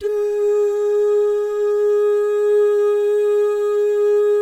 DOO MIX, Vocal Planet Jazz, GUY GAL

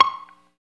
GTR Mute; 90's Sample CDs; Club-50 - Foundations Roland; Funk & Mute; Chunk Mute

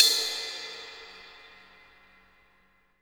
CYM Ride; Best Service Gigapack 2

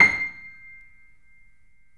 BPM L6 C, The Piano; InVision Interactive

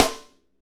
SNR FNK, Funk Kits, Roland; Northstar - Drumscapes Roland

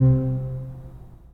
3D Pipe Mix Celeste, 3D Organs

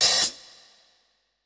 Kit, breakcore Drum Hits

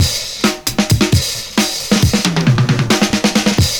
beat fill, 133.5; Univers Sons

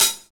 Folk Closed HiHat

